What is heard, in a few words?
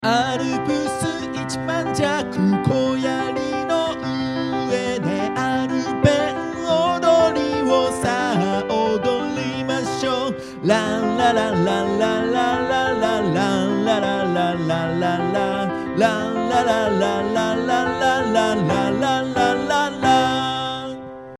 piano